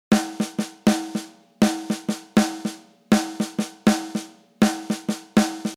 14 snare

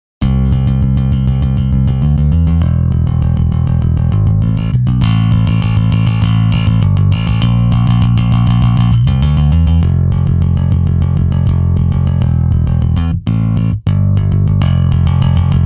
bass eq

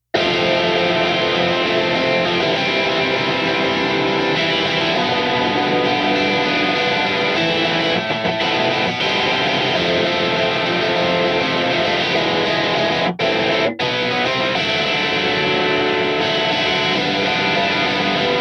guitar

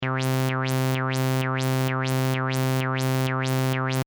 lfo cutoff

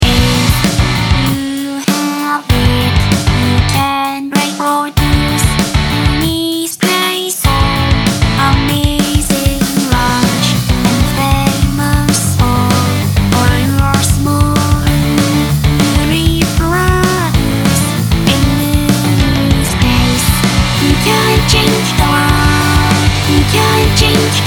low cut

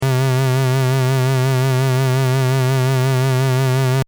lfo

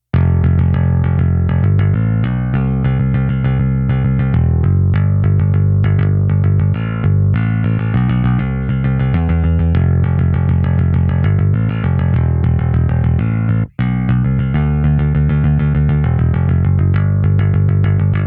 bass

